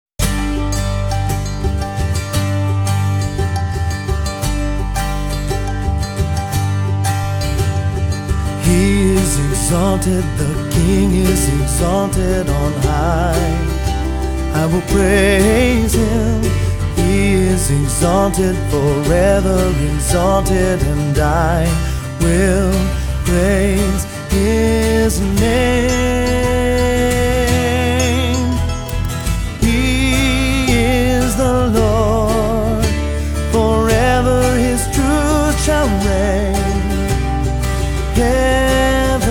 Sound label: acoustic worship